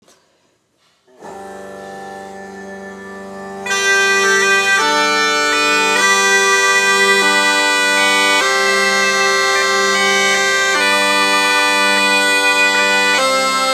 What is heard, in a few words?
waltz beginner